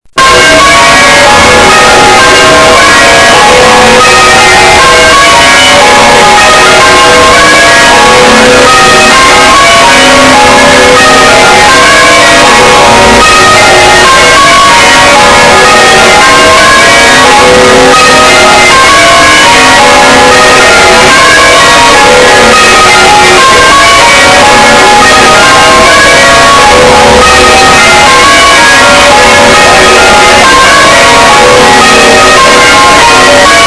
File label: church bells; brize norton